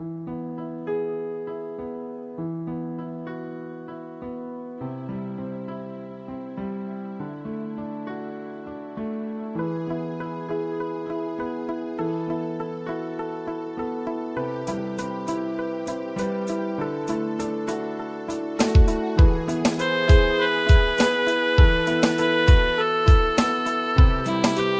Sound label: (Piano); Piano instrumental